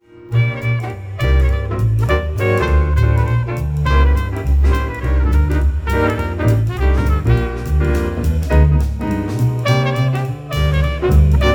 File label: bass